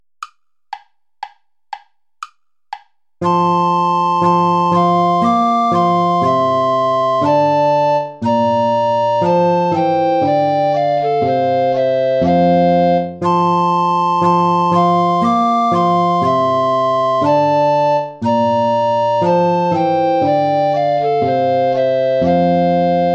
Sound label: play along without tenor recorder; praetorius michael how rose blooming